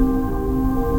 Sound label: near win loop; silverback